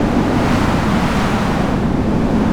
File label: storm loop; MagicArsenal